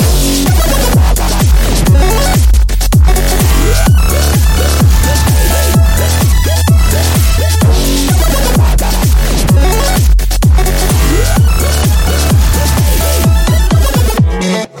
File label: dupstep